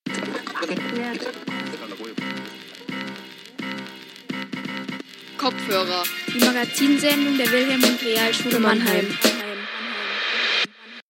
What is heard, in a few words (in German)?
Jingle Kopfhörer; Radio im Klassenzimmer in Mannheim